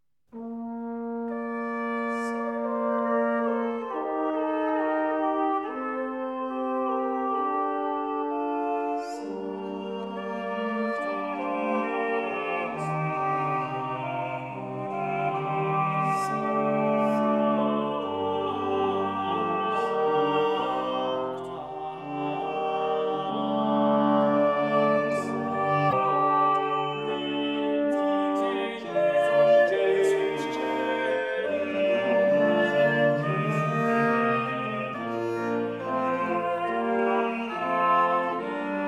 Missa, Sanctus; Missa 8 vocum La dolce vista

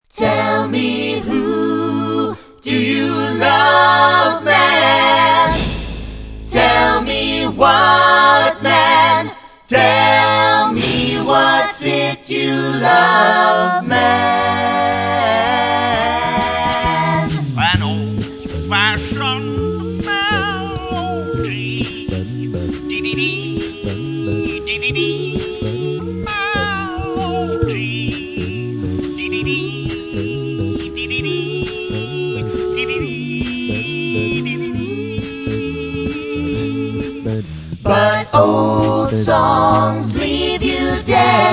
Electric Blues

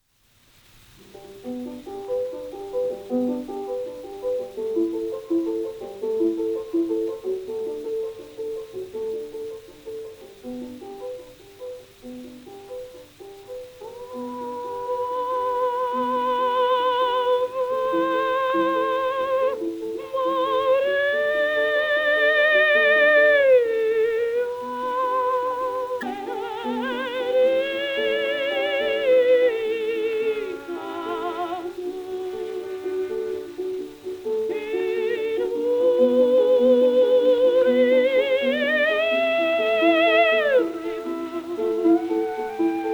Violinist Pianist Harmonium Player; Ave Maria

